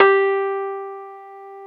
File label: Keyboards Old School, G3; USB Soundscan vol.09; AKAI